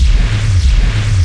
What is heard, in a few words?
FAN